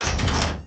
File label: open